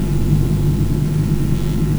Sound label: Noise; Ableton Live 10 Suite